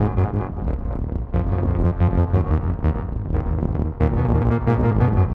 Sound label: SYNTH WET